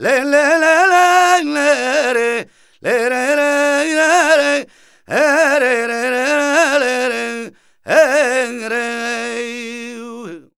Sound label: voc c#m, Flamenco Vocals Male Cantaor; Male Cantaor 2